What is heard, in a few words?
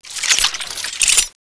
void pistol draw; weapons